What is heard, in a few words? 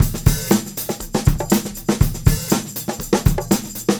Combo, R&B Drums